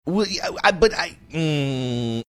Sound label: making series funny sounds